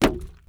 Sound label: Panel Storage Grab can; Among Us